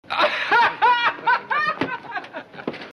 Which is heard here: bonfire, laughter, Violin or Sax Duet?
laughter